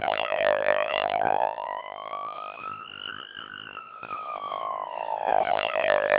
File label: siren, CODEC2 1600 bps